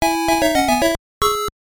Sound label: Game Over; Revenge of Drancon; Game Gear; Unused SG1000